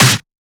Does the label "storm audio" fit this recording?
No